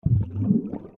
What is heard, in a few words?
MedicalScientific; Soundfx III